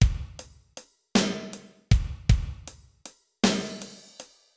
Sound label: Basic Beat Hi-Hat Crash; Pro Tools Blues